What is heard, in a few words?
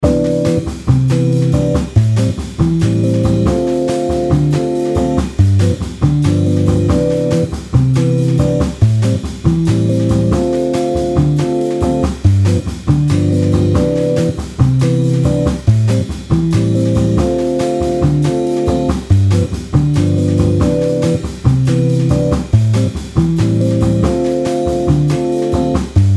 latin vibes brazilian